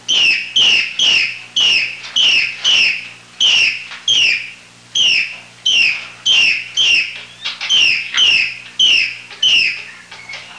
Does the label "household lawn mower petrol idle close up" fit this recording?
No